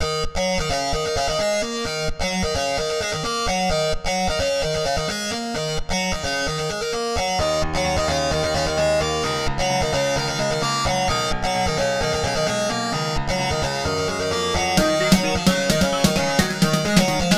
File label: racing tecno